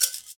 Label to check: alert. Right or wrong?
Wrong